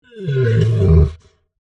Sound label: Monster Groan, Horror, Monster, Groan, Creature; Deep; Monsters (Sound Effects:Horror)